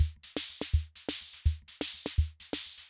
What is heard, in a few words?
Loop, rhythm king; classic machines